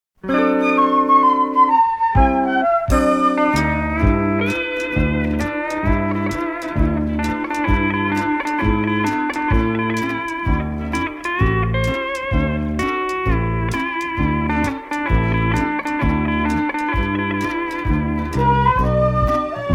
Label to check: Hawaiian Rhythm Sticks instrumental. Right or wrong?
Right